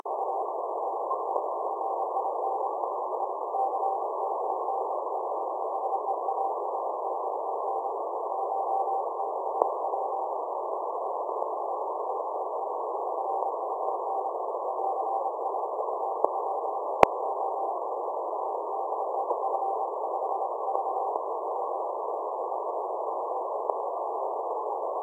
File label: weak CW